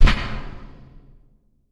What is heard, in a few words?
Button Click; Custom UI Sounds; Bloodborne